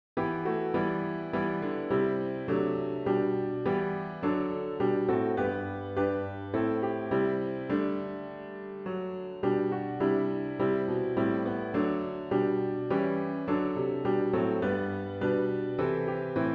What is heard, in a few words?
piano only; Today We All Are Called to Be Disciples